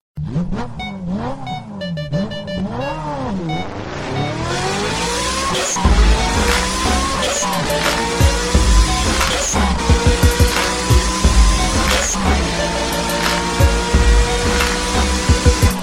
New Car

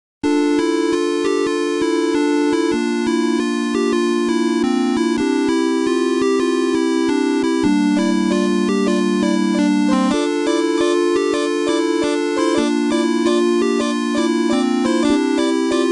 8-Bit; Doctor Who

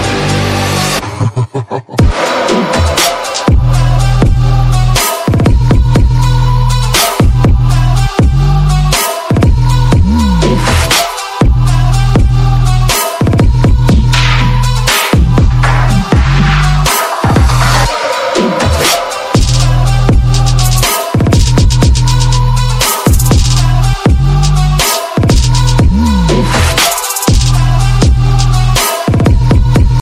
Turtle Drop

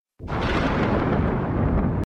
Mild Blast

